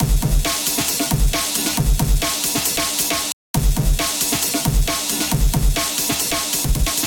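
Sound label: drum beat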